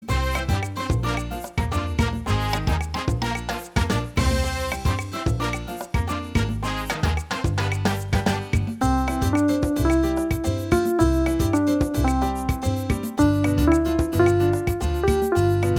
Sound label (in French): mambo